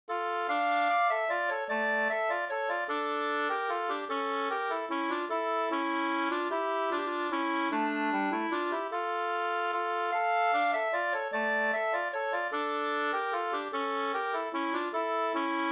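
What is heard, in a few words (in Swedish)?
vals, two-part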